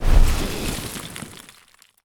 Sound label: onager hit stone